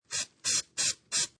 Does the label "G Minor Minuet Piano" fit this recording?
No